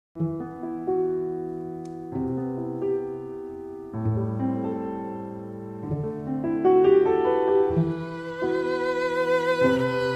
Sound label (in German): quartett